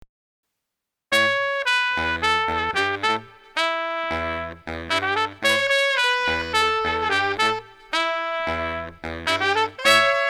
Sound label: Street Brass Combo 110